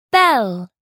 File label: bell